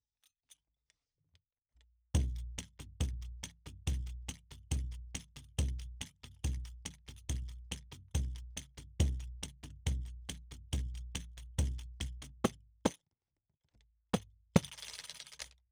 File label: Pandeiro Close; Yasu Mix